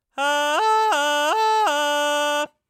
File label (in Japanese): 地声と裏声を切り替える